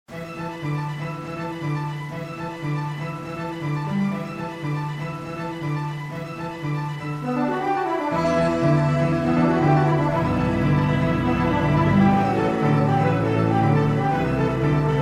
horror remix

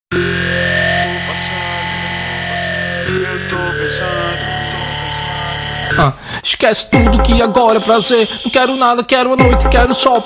Dubstep Remixx

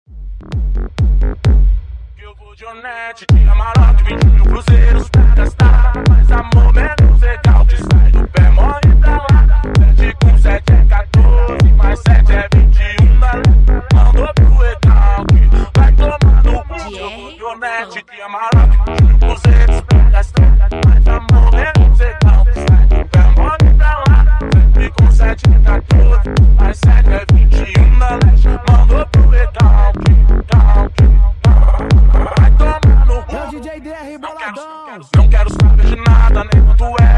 funk automotivo remix

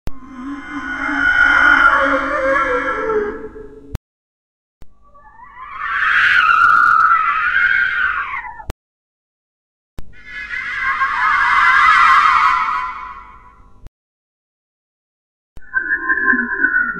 creepy sound horror sound scary sounds

